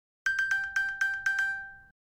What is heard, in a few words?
iphone find me chime